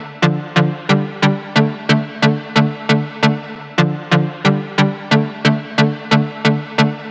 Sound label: Synth Loop D 135, Techno